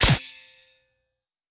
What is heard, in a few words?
brighten sword